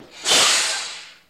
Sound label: Air Brake Diesel Truc; brakes